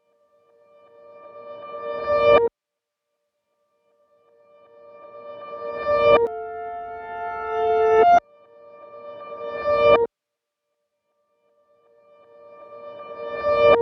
Bpm127 D Piano Rise FX

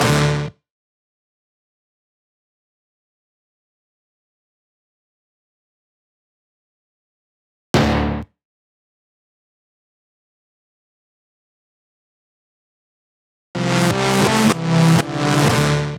Synth Stab 124

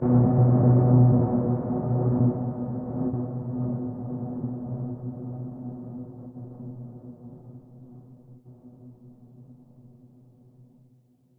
synth wavy B

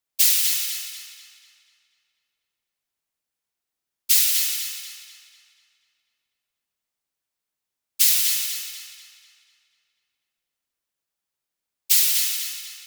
Shaker 123 G Dry

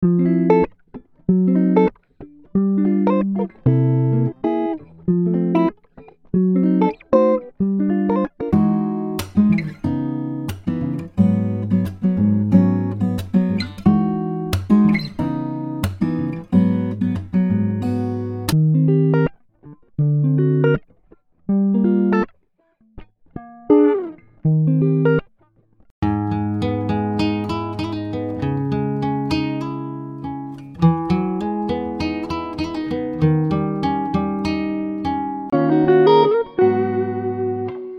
Electric and Acoustic Guitar Lofi; Rapp

